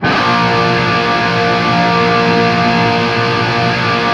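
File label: PWR A# LP, guitar power chord loop; East West; Steve Stevens Guitar Samples Collection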